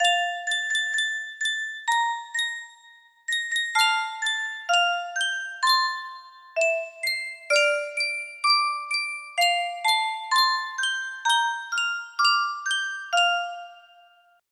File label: yunsheng music box